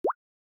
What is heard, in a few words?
Water Drop Notification Night; Xiaomi Redmi-8A